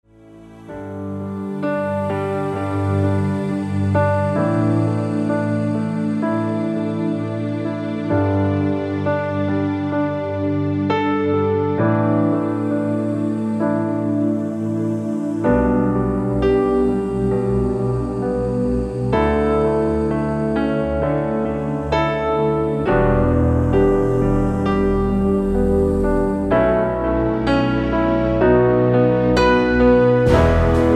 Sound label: Key Of F C Major, backing tracks